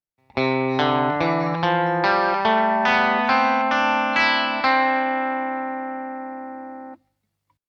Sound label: country